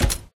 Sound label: click stereo; Immortal 1.20.1 vanilla; minecraft